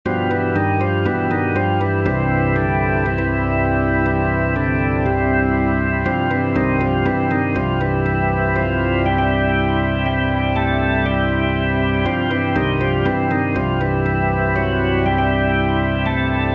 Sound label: organ